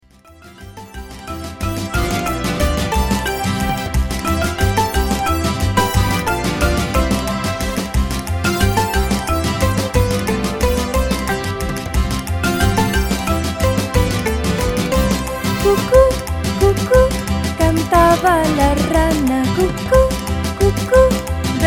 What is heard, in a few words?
cucu cucu